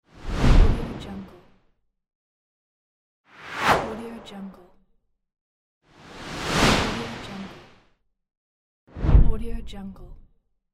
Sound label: whoosh; tibasound